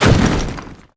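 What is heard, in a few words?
impact builds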